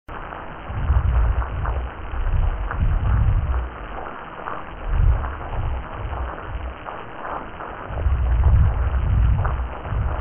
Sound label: Croakers Dawn